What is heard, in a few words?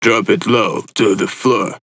Vocal Phrase